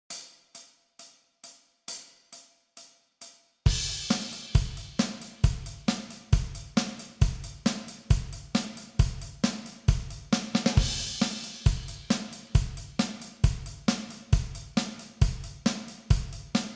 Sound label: 135 bpm, practice tracks